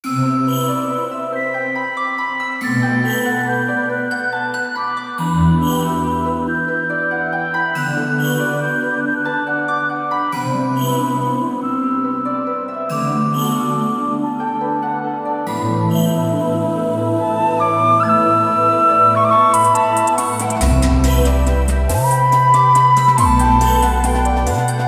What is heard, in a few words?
Bgm field ancient